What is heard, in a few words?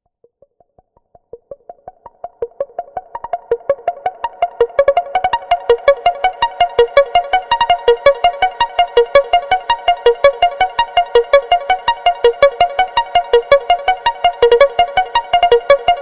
ratcheting, synthesizer